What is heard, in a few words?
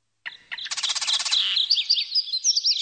Birds In Forest Sms